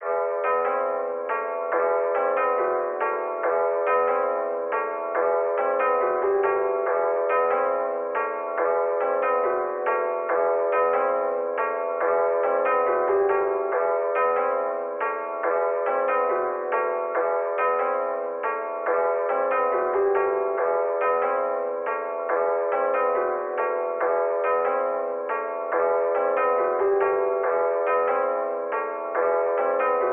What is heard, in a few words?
140 BPM E Min Piano, Drill; Cymatics; 2022 Melody Collection; Loaded